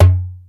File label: tabla big dom, Drum Machines, Yamaha Motif ES-Series